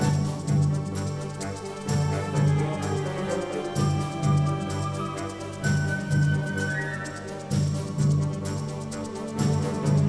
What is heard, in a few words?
Music Segments Tension Theme Bpm128 Loop; asterix obelix slap them all 2